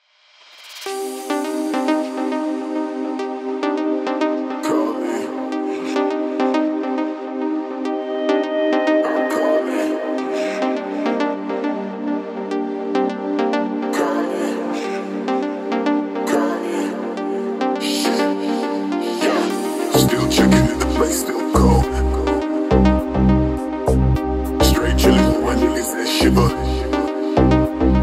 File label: deep house remix